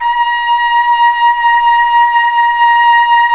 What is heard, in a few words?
Tpt p, Solo Brass